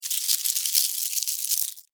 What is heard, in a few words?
Insect Move Object, Insect, Movement, Object, Drag, Bug; Leaf, Foliage; Insect Movement Sound Effects; Insect Movement (Sound Effects:Animals:Insects); Blastwave